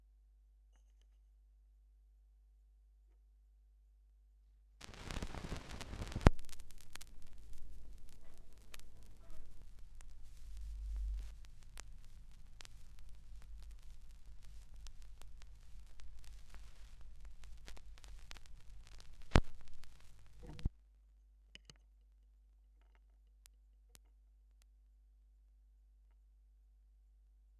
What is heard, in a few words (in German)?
schallplattengeraeusche; rauschpartikel